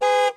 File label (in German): hupe; minecraft